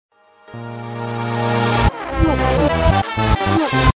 draw music, reverse

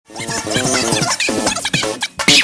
truck

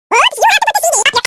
whoops

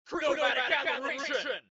chromatic; cave

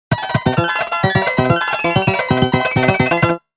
right backing

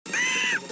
OOOOOH

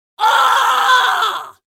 yell

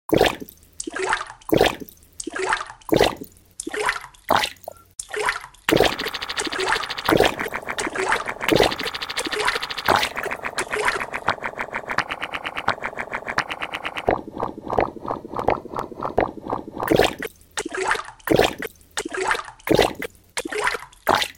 toilet sounds

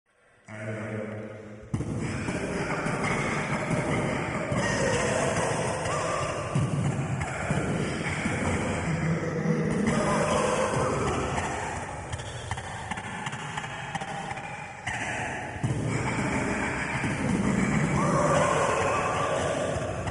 pool

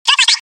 flashbang

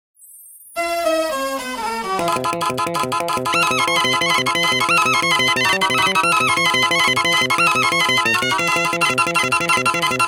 otamatone

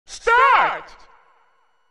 Start

